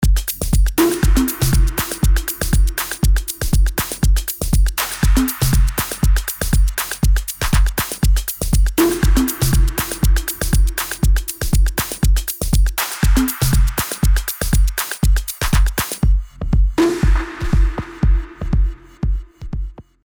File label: maschine dry